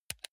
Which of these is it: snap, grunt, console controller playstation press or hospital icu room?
console controller playstation press